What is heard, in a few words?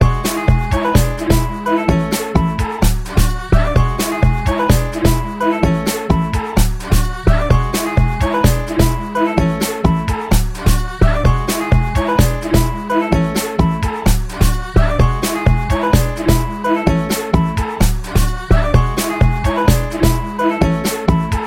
Nokiapiano Beat